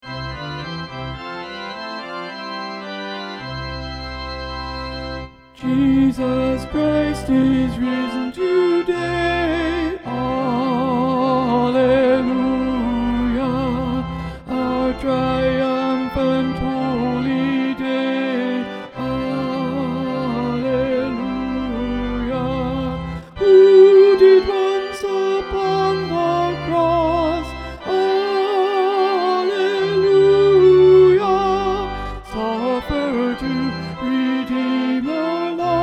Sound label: alto; Jesus Christ Is Risen Today